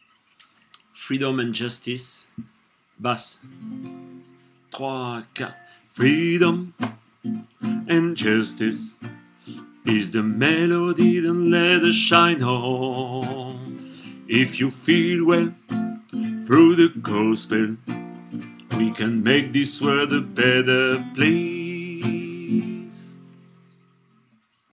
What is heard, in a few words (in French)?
basse